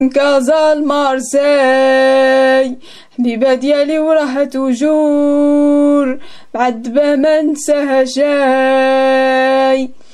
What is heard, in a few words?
nice moroccan ringtone